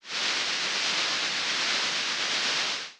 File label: steam